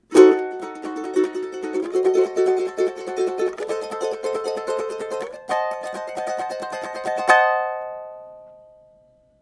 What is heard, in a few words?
Cdim7, ukulele